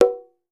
African sour, African set percussion; Voice Crystal - Steve Reid Definitive Percussion

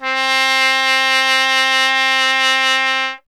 TP C; Miroslav Vitous - Solo Instruments VOL-1; Trumpet

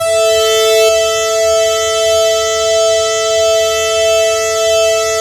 YAMAHA CS80 PWM, Vintage Keyboards; Big Fish Audio - First Call Vintage Keyboards

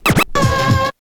scratch kit, vinyl

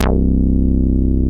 Pro-1 bass; Greytsounds VOL-1

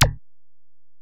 EPerc, Alesis DM-PRO

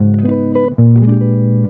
EGUIT CHORDS; Best Service; World Colours

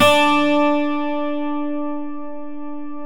12 STRING; E-MU Producer Series Vol. 2; More Studio Essentials; Composer

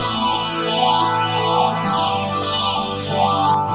Synth Sheen; Sonic Foundry (Sony Creative Software); Knutrix Drum & Bass

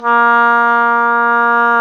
oboe, Roland Orchestral Winds

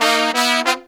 132, Pop & Funk Brass

